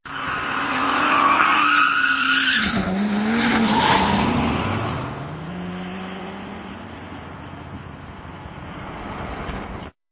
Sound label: CAR SPEEDING AWAY